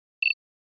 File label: Button HF Electric Be